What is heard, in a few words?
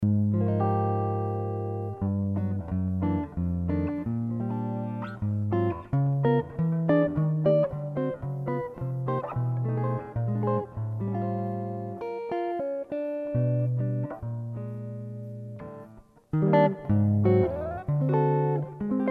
guitar and backing